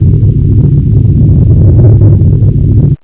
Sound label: boulder; valve